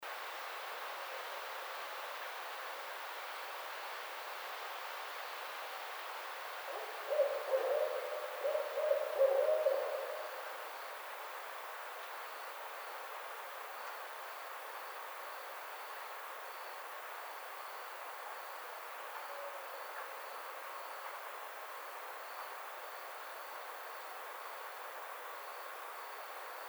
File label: Barred Owl